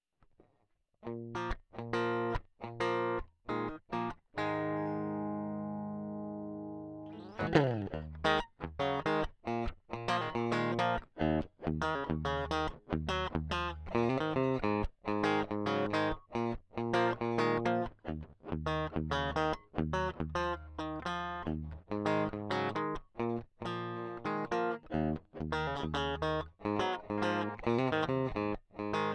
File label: Crunchy Blues